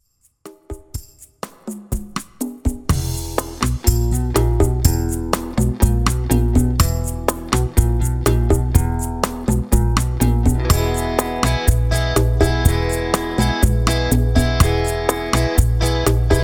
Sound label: spanish, backing tracks